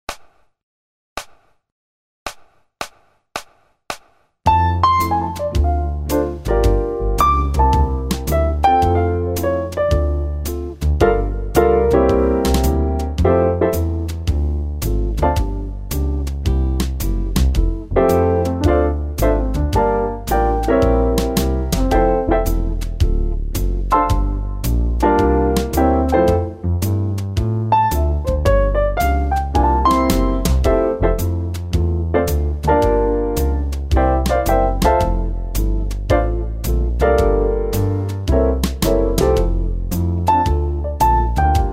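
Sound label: Jazz playback; MyVirtualBand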